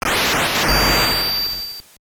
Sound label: Warp; Gradius; MSX